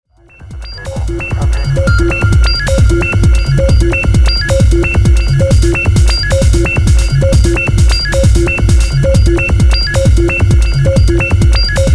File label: Locked Groove